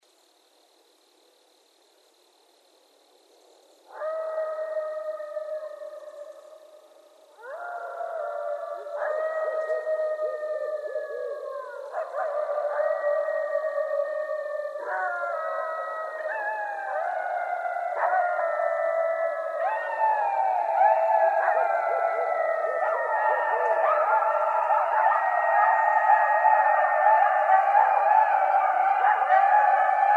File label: Coyotes; Coyotes (Canis latrans)